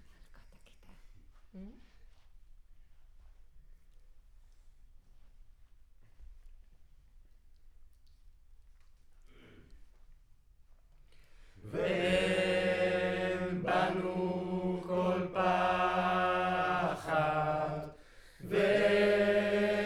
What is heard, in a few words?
Center u47, Choir